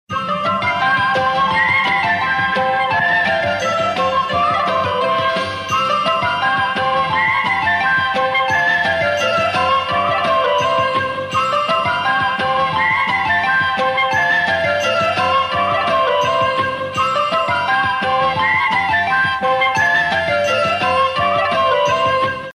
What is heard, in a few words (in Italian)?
jingle; Jingle della ruota - Pranzo 1982/86